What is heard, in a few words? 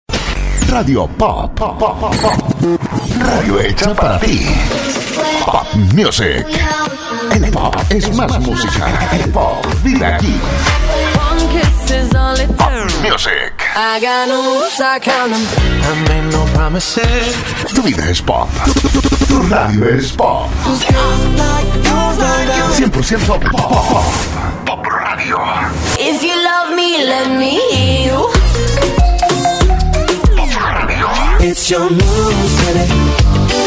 Radio Pop